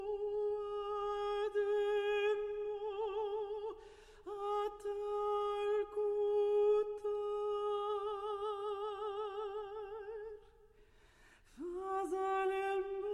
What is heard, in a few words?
folk songs